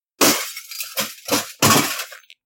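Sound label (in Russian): некоторое стекло нужно было разбить чтобы выбросить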